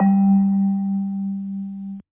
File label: key